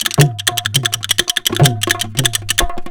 DHOLAK, Indian Traditions; USB Soundscan vol.45; AKAI

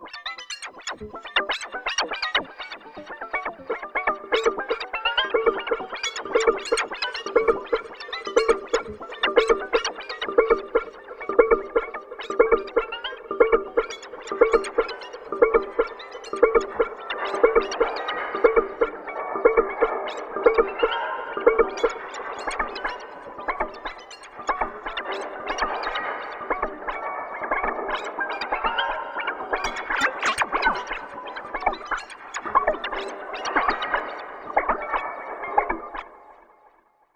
Arp Crazy G3; Zero-G - Ghost In The Machine

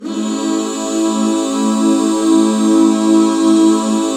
A 7, back chords; Sound & Vision - Gigapack II CD 1

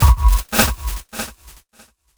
drbreak, drumbreaks; Masterbits; Vinyl Attack

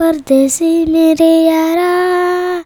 CHILD VX C, Indian Traditions

